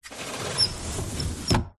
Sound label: household cupboard door roller squeaky open or close; zapsplat